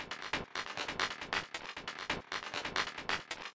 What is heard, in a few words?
techno house mid robotic perc crispy; synth loops